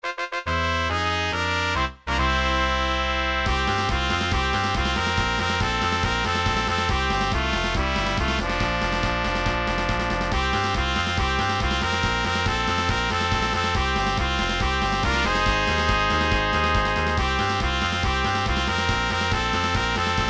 victory; Game System Super Nintendo; Super Mario RPG; Culex

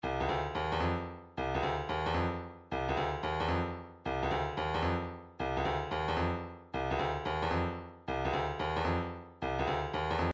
165 native drum

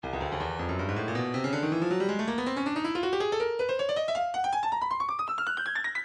breakbeat 160 bpm; Stylus; drumnbeat

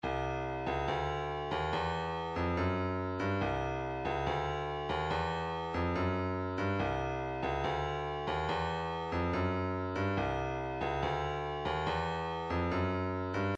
separated elements kick

